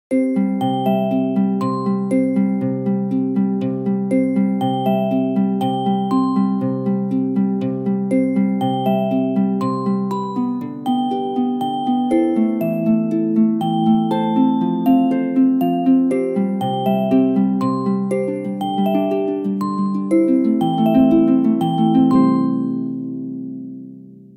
Alarms; Gome U7

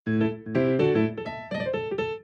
Piano; Gome; U7

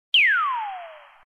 Laser, notifications; Optimus L3 II E430 Movistar; LG

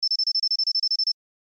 Low power ringtone; Samsung; Galaxy Watch3 SM-R845F; Emergency

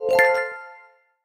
a gust of wind, notifications; OnePlus; OnePlus 11

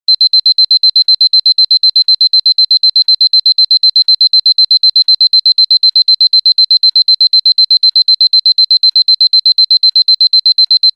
Beep

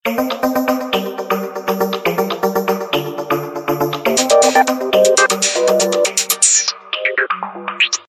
Carbonyl; Samsung; GT-M7600 BeatDJ

